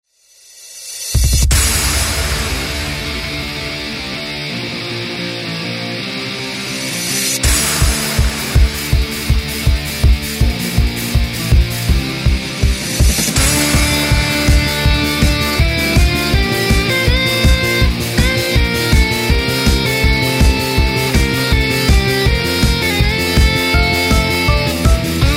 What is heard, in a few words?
tension